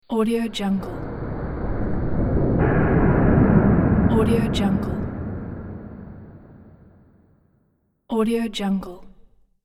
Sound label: volcano island atmospheric